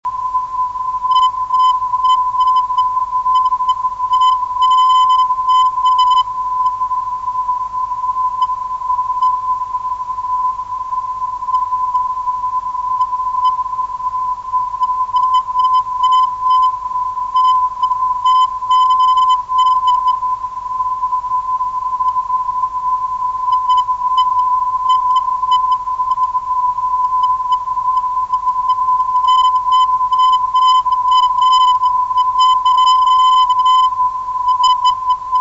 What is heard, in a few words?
431 kHz, ndb